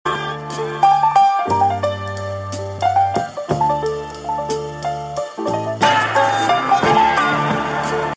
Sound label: notification ringtone; pretty light notification